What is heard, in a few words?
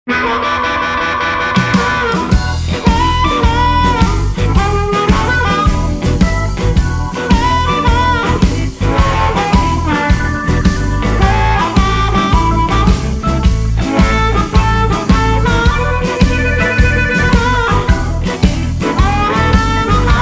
Low Down Blues